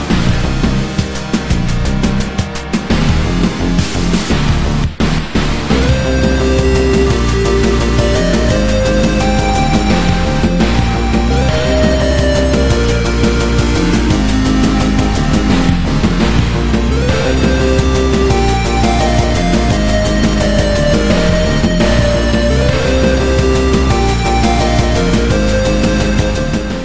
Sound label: whirlwind